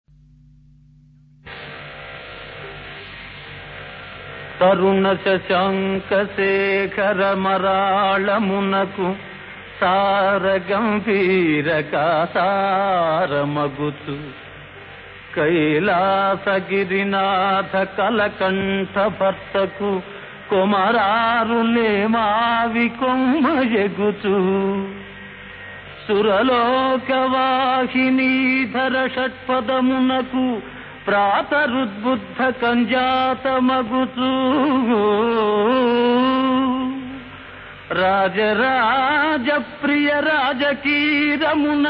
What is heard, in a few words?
Padyam; TenaliRamakrishna